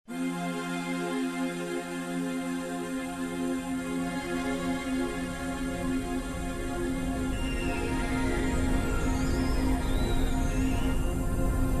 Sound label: No Sax Key Of F minor